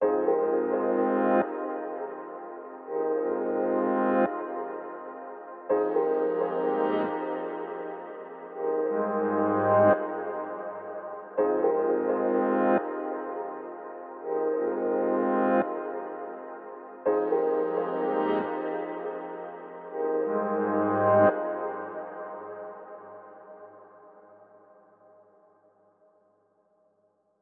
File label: 169 BPM F Min Piano; Cymatics; Twilight; Destiny Gold Expansion